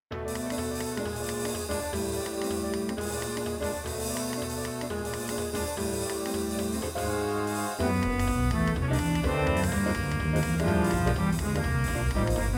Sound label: Grand Player Organ